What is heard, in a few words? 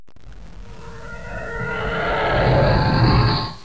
tie fighter; Star Wars